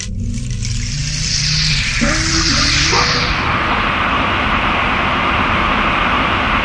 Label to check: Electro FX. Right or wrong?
Right